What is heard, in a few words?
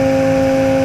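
engine exhale, advanced engine sounds; engine2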